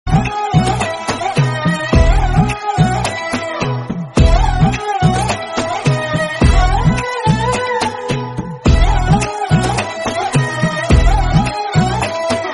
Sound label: New Romantic Music Instrumental Ringtone